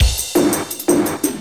loop, 170 BPM, drum & bass

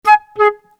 c min flute; PowerFX - Downtown Orchestra; Orchestral arrangements

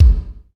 KICK BOOM, Ambient Kick; Roland L-CD701; Ambient K1

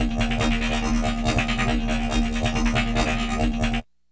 RING MOD 140, Trance; AMG; Global Trance Mission VOL-2; PROCESEDLPS

